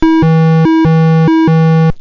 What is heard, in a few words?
rip alarm